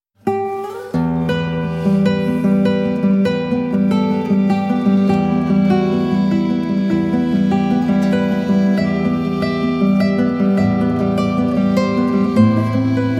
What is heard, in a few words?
Romance Spanish Trad, classical guitar